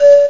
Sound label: Pan Flute